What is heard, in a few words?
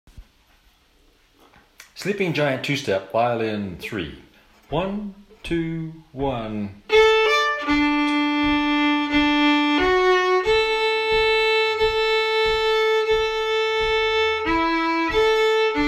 violin three